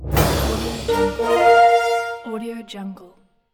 game victory; absinthemedia

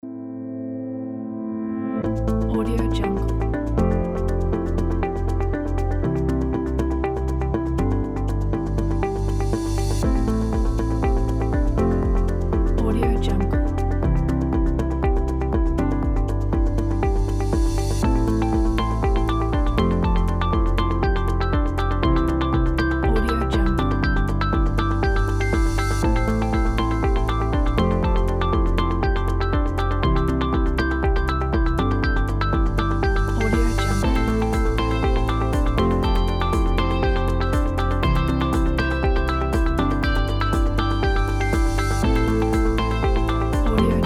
background corporate

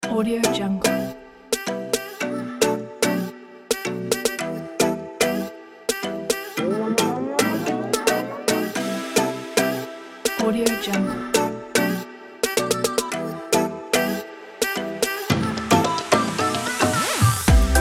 tropical music

